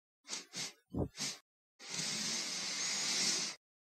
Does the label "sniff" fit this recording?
Yes